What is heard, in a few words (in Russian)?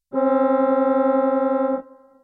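орган аккорд спокойный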